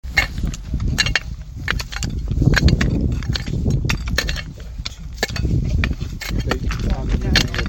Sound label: Picketing